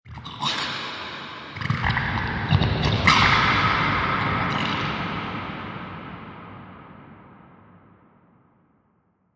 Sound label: Slime Pits Fantasy Horror Ambience; Slimy Sound Effects; Andrew Fleming